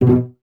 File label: CES B Pizz, Cellos; Miroslav Vitous; String Ensembles